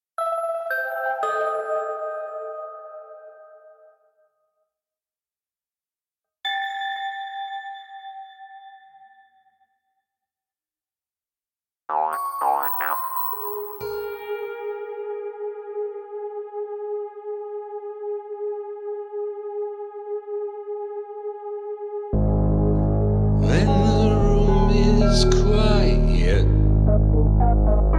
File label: Karaoke